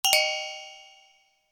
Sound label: UI Quirky